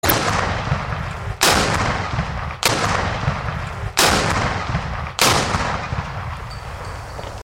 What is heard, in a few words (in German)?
5 schuss